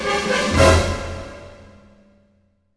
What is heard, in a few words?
Orchestral Loops